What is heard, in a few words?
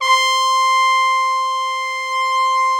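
BRASS C5 left, ORCH BRASS; Greytsounds VOL-1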